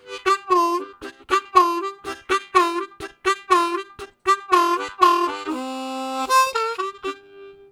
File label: Harmonica, WAV Riffs; Zero G